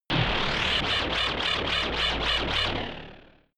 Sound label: shot wobble lurking tension; pulsieren